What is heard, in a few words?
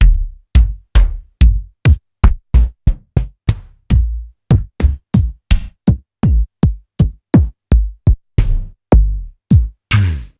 Medeli DD-5060